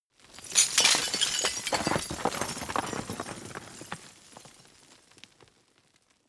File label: roll fall; industrie wirtschaft; baugewerbe